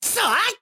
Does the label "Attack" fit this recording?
Yes